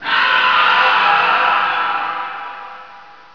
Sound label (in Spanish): grito